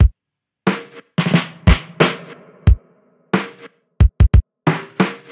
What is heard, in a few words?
Beats beats; Frogger in Toy Town